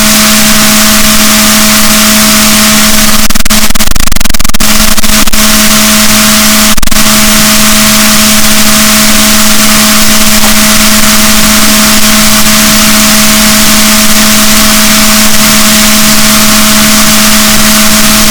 rorquals positif, Balaenoptera Physalus, gaussian noise; SNR 24dB